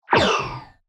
Blaster

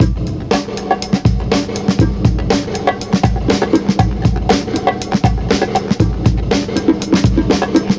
Classic Breakbeats Processed 2 Bar

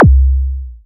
Club Kick

